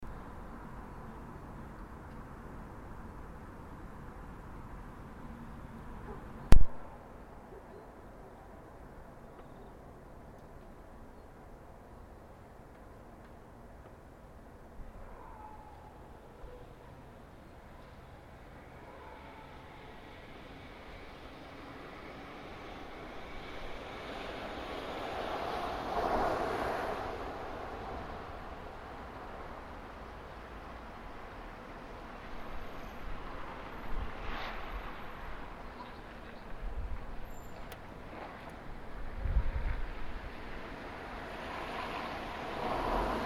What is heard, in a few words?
sounds of outside with first birds this year